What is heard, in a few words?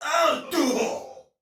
Death, Soldier Male; Dialog; Barks; Battle; Sinecure